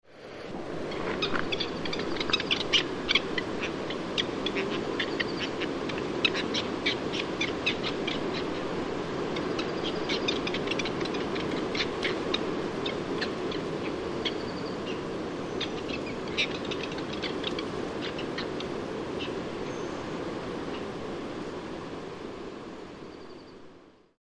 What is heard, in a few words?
phaethon lepturus; ptaki